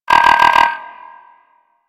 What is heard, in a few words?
Puzzle Input Incorrect; Ambience; Warframe; Corpus Gas City; Gameplay Remaster